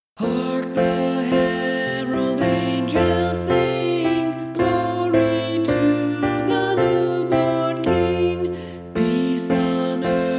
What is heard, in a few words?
Hark Alto; christmas choir rehearsal tracks